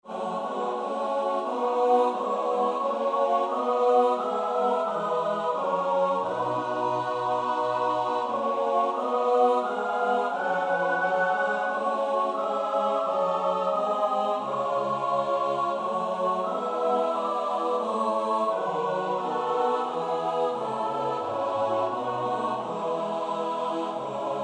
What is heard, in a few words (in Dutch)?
S-A-T-B, koorpartij oefening; Bach (Johann Sebastiaan); BWV 145